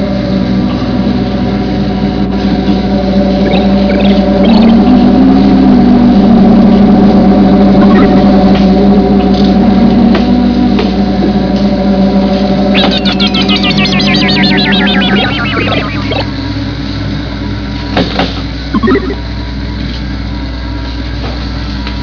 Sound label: console